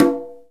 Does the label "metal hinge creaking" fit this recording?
No